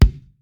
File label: Kick